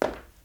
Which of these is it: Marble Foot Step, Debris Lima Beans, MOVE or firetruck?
Marble Foot Step